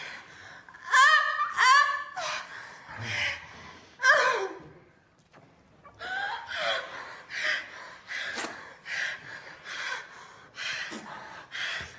argh

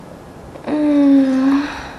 mmm enjoy

